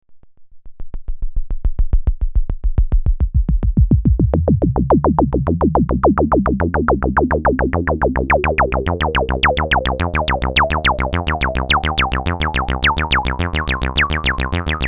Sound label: Filter